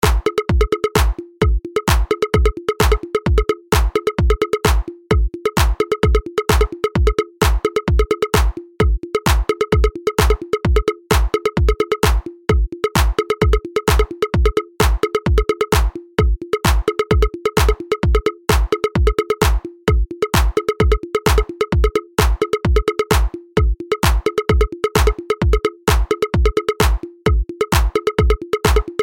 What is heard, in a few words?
Bongo